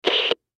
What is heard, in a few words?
Cb Radio Static; Miscellaneous Electronic; Blastwave